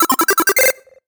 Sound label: Music Effect Solo Chip Square Positive Stereo; super mustache